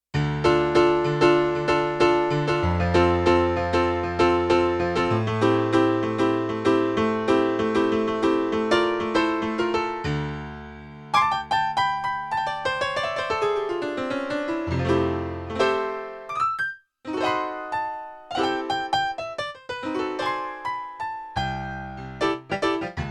Grand Piano; Yamaha TX16W Sound Library; Jazz Performance 2

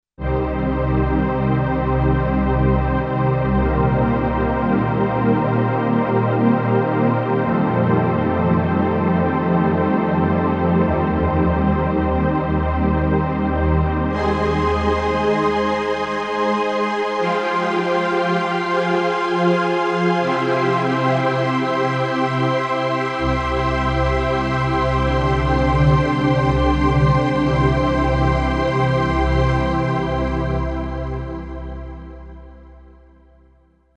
Symphonic Ensemb

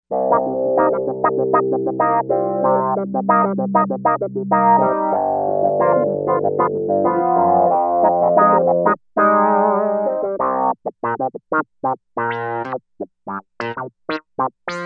Clunky Favinet

